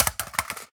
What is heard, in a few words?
phys mag drop ar metal concrete, magazine drops